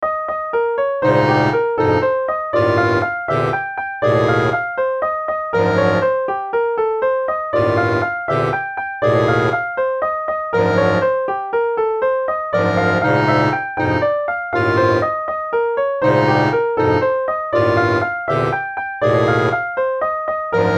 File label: LOW WOODWINDS SOUND